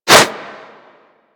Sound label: Whoosh, Weapons; Warframe; Furax